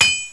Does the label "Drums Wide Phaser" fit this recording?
No